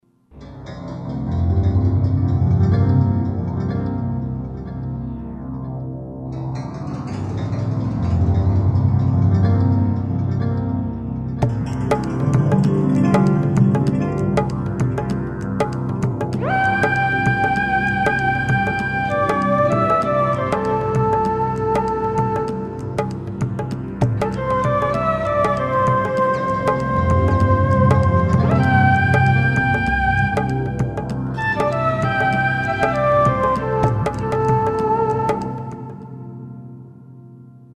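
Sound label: Flute & Accompaniment, Roland SR-JV80-14 World Collection Asia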